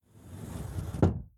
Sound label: Hiding Spot Enter Door; Devour; The Inn